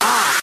"ah" group of robots, Voice; ooh ahh

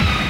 screechy

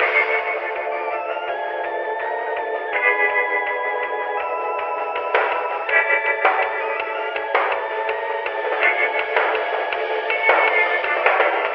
keys, phonky loops

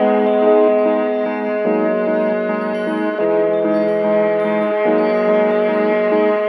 keys and sax geneveve

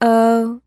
"oh", SUNG; ooh ahh

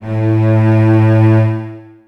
cello; String

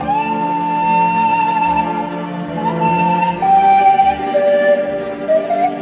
flute samurai, Loops, Jazzmatazz

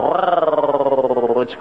growl leering, Voice; ooh ahh

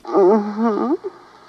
"uh huh", orgasm; ooh ahh; uh um

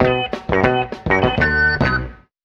horns, loops

